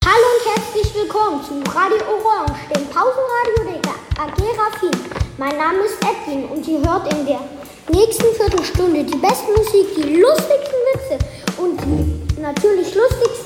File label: jingle